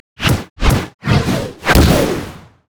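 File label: volibear recall; runewars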